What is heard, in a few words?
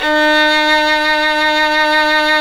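solo violin, Synclavier Strings; ILIO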